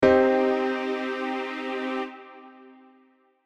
C minor Chord

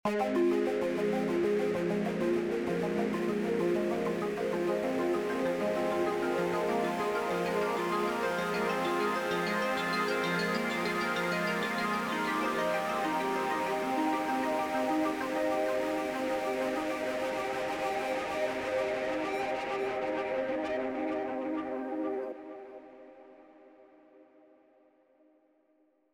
Widened FX Arp

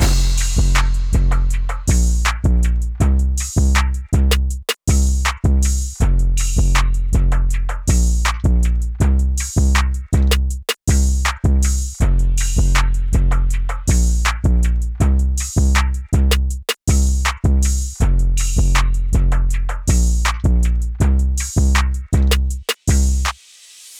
Type Beat Drum

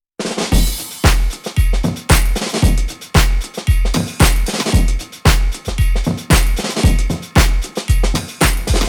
No phase distortion drums

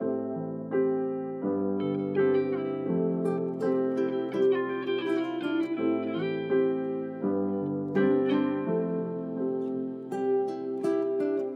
Type Beat Melody Example

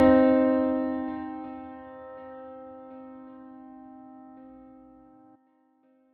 C Minor 1st and 3rd

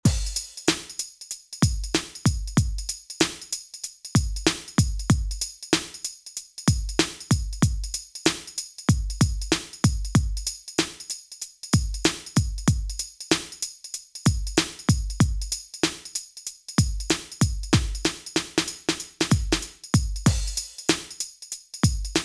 Sidechain Compression Tips hats to snare ON

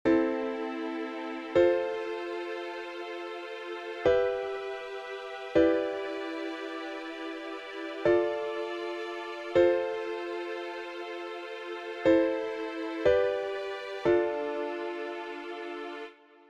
Am Diatonic Progression

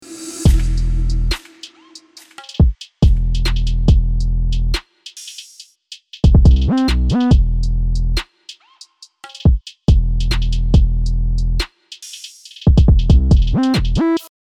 Drum

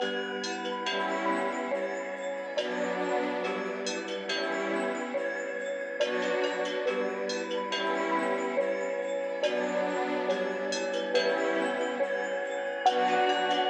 Type Beat Melody

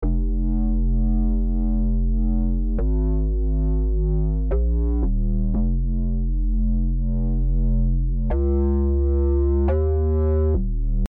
Bass

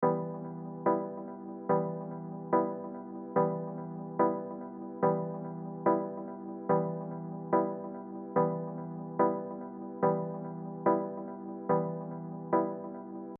Trap Beat Chords

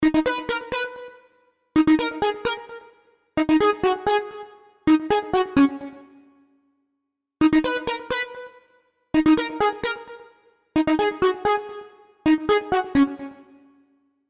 Melody

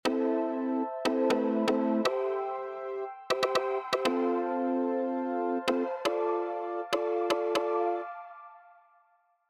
Octavized Reverb UP CHORDS

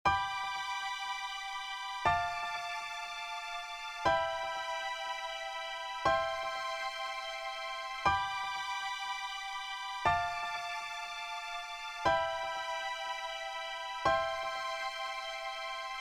Chord Progression I V vi IV G Mixolydian

